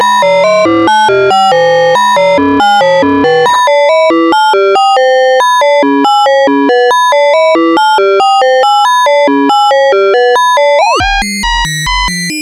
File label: ARP 2600, SEQ; TECHNO-BOX